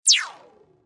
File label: laser; spacemap; npcLasers